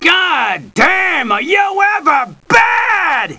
laugh; BattleSNDS

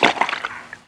DEATH DISINTEGRATION

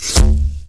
LOAD MORTAR

